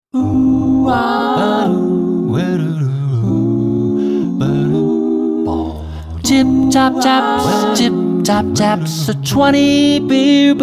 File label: mellow; Tip Top Taps